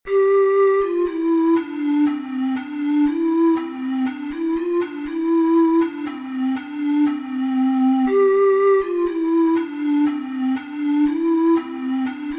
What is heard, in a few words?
deck the halls, ocarina six hole